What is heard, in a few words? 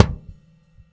Punch, Kicks; Airwindows